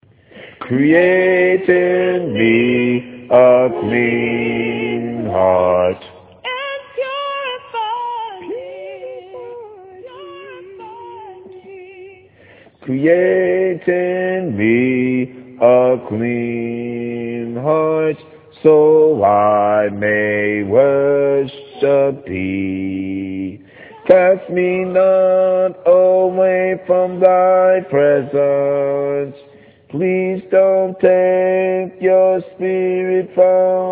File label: Bass